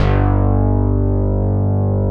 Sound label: THUNDER; Greytsounds - Scott Peers Sound Engineering